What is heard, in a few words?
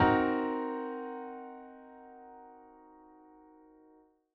tetrad m7b5